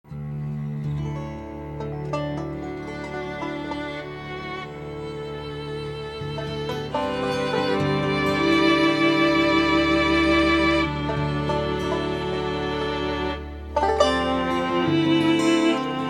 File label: Old Time Transition, music